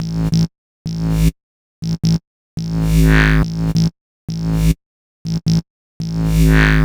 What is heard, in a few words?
140 E BASSLINE, Dubstep; Loopmasters; The New Sound Of Dubstep; BASS LOOPS